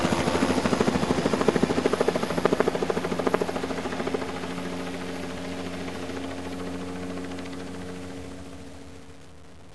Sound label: copter; wasteyard